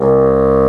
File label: BASSOON C2; AKAI S-Series CD-ROM Sound Library VOL-8